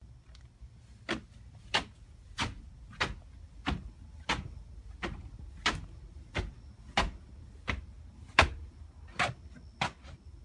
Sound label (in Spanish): escaleras